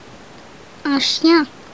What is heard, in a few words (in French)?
chien, animaux